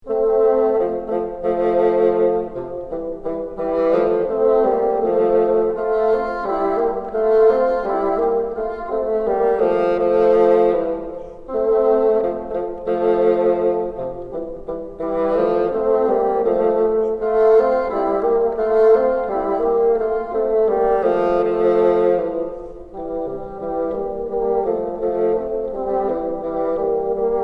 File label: together, bassoon